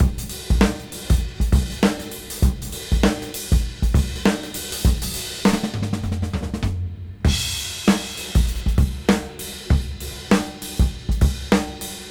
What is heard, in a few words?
Swing 98 BPM; AMG; Keith LeBlanc Phat Butt Jams